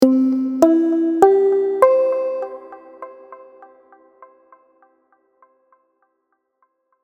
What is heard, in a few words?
notification